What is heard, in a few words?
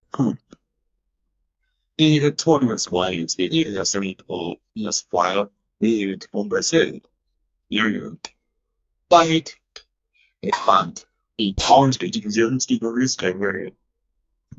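to do task notification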